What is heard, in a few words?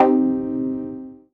Fm Dub Chord